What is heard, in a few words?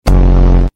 boom